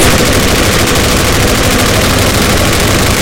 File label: Browning 3R; Weapons; DCS